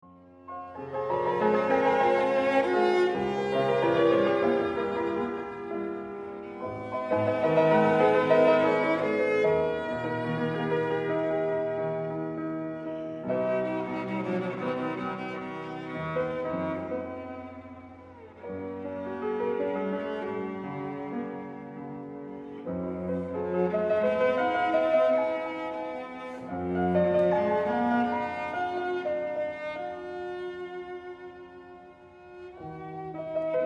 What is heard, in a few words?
Elegy for Violoncello Piano